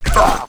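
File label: damage, monster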